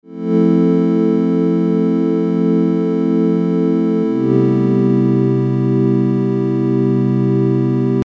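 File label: Envelope Synth Pad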